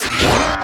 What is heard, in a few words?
Gun Weapon Pickup; SFX Kit; Pickups